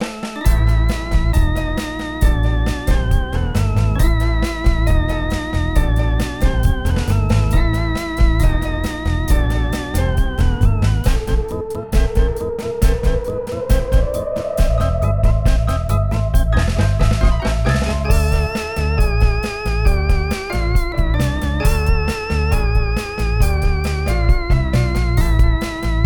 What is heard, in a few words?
Speakeasy Music; Costume Quest 2